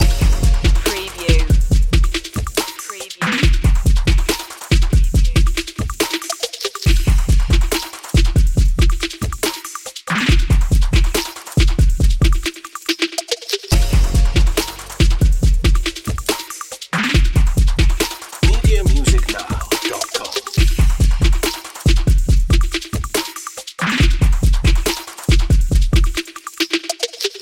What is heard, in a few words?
urban groove loop